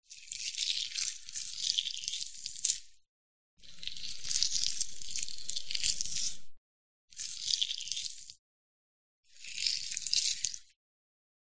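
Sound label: Incisions Flesh close up; audio hero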